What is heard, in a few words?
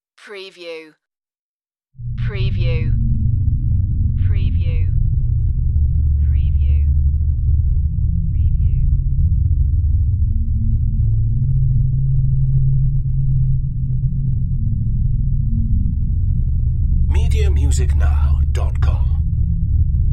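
Deep Movement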